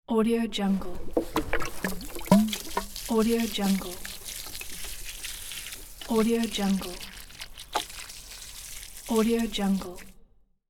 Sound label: watering plants with plastic can; domestic sounds